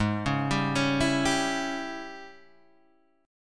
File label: G sharp Major 7th Suspended 4th Sharp 5th; G#M7sus4#5 Chord {4 4 5 6 5 3} arpeggiated